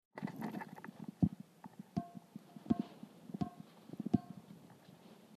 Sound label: Metallic Sound